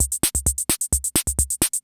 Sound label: CR78